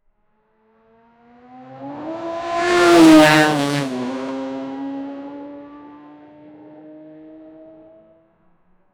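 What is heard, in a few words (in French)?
voiture de course très bruyante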